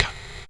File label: Gated Kit, Percussion; Best Service ProSamples vol.37; Dance Synths